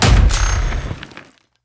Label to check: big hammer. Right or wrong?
Right